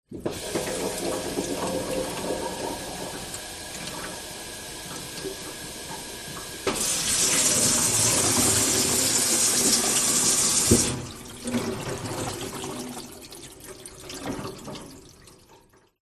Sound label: household metal kitchen sink tap run water drain through pipes under sink; zapsplat